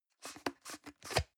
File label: plastic can small lid screw open medium